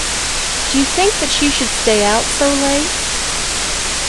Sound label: late noise; White Noise; Signal 1 With White Noise